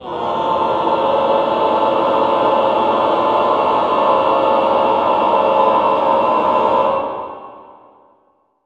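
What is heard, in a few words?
choir cluster; Sound & Vision - Gigapack I CD 2 (Roland); Cluster 1-9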